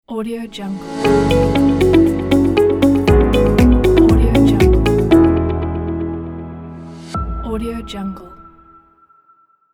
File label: corporate opener logo